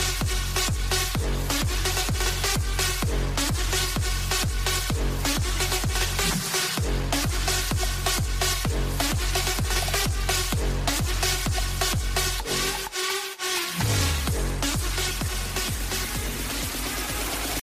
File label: sax iphone xs max remixs ringtone